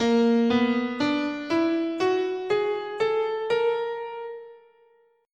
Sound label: A sharp Enigmatic, scale